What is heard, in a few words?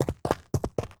Cyber Horse Walk; Seer; GamigoNA